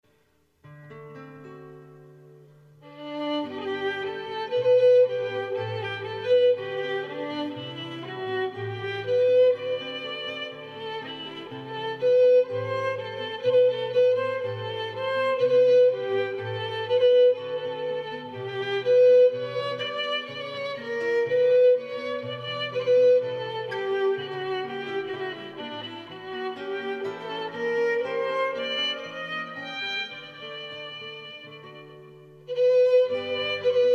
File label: serenade; bearn abelle